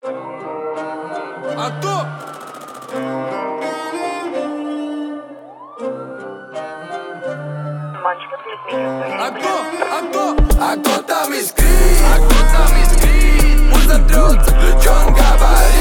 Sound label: 31.33.35hz Low bass